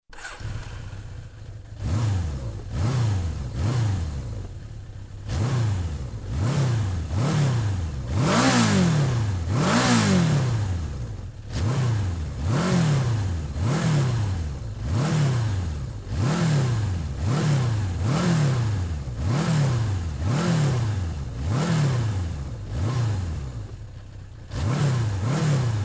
Motorcycle starts, regassing, jamming

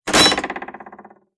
Throwing a knife into a tree; cold weapons

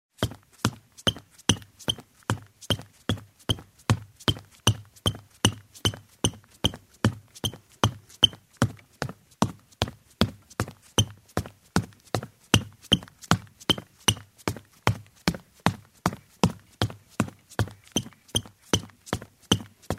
Running on a wooden floor in shoes; steps

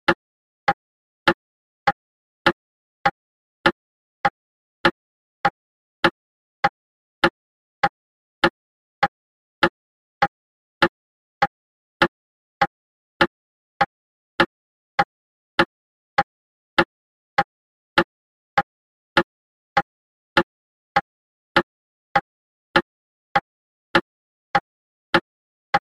The ticking of a mechanical pendulum clock; watches

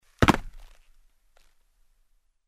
Jump on the wooden floor with shoes; steps

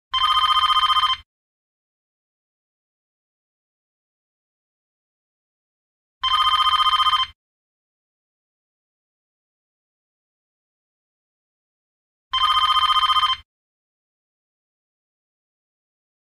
Phone ring

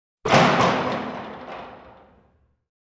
metal door closes

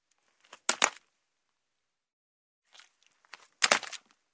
Jump in the mud; steps

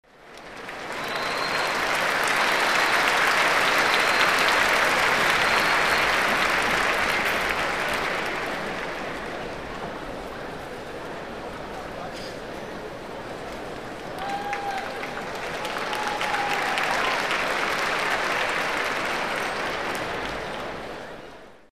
Ovations in the concert hall; standing ovation

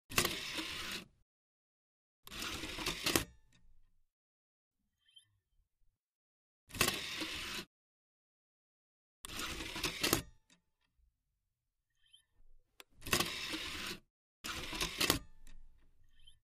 Opening, closing the CD-DVD; computer

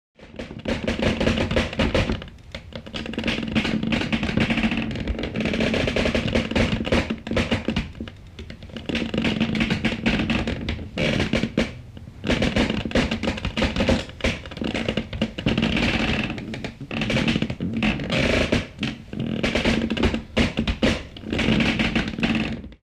Wood creak

